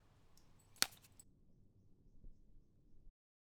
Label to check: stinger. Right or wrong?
Wrong